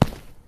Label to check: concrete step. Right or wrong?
Right